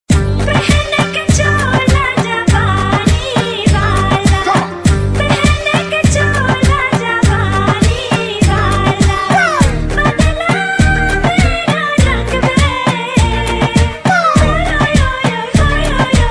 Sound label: Arabic Dance